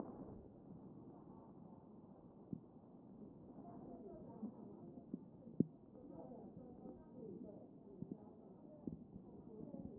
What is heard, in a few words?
CHEST pos1 D MODE